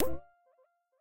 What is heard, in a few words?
Ring On; MiSide